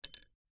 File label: cart